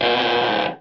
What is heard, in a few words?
vintage sharp wood creak; Cartoon Sound Effects Kit